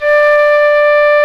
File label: flute, C flute